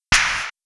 Snare, Snare Patterns; Beat Components

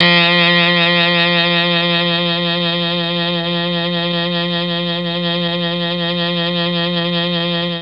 F2 MKII SAX, Mellotron; Mike Pinder - Mellotron Archive CD

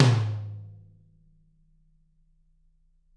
TT, Toms; Bob Clearmountain Drums I

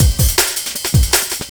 W Ride Beat, Drum N Bass; Sonic Foundry (Sony Creative Software); Knutrix Drum & Bass

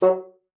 bassoon, F#3; Xsample VOL-6

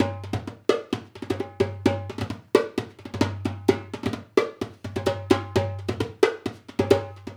Dumbek; Essential Percussion

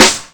Bang Snare; Mo' Phatt; Digital Sound Factory Mo' Phatt

